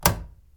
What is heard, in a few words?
turn off breaker; electric